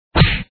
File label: Punch